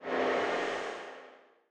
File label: Zoom Chunk; Insanely Twisted Shadow Planet